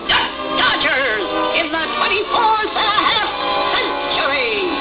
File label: duck; "Duck Dodgers in the 24th and a half century"